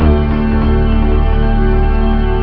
beat

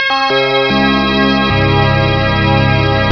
organ minor chord samples

